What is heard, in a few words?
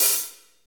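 hi-hat, Roland Kits; Northstar - Drumscapes Roland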